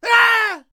Samurai Attack Vocals

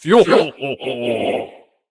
Attack Vocals; Candlehead Old

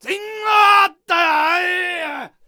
Samurai Attack Vocals

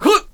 Sensei Attack Vocals

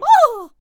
Halfling Attack Vocals

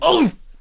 Hwacha Attack Vocals

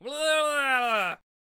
Brawler Attack Vocals

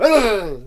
Cannon Attack Vocals

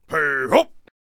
King Attack Vocals

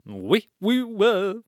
Present Elf Attack Vocals

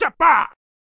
Knight Attack Vocals

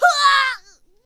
Radiant Glaive Death Vocals

